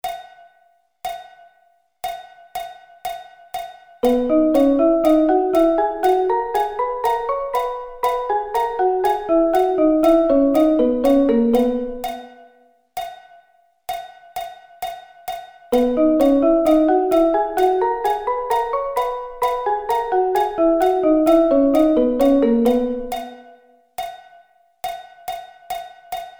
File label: B Thirds